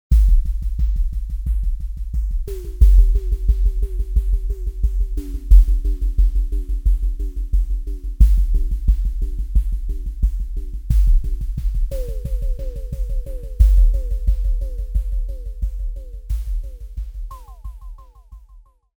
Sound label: deep drums; opxpro